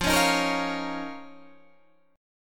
F 7th Suspended 4th Sharp 5th F down Guitar Standard; F7sus4#5; strummed; JGuitar